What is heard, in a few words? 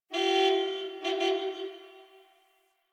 Distant Car Horn